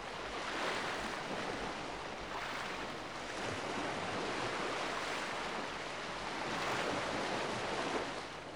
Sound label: sea wash close